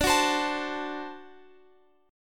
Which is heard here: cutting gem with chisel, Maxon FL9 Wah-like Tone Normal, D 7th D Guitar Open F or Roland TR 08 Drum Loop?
D 7th D Guitar Open F